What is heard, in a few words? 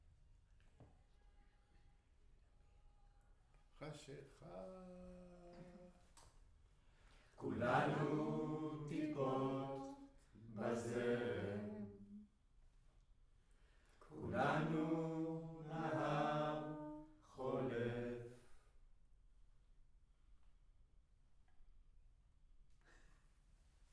Room, Choir